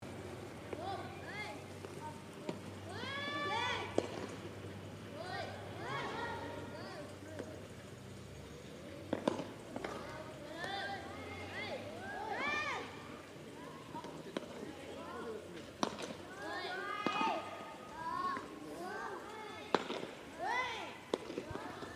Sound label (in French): Baseball Enfants, Hiwasa; Sounds of Hiwasa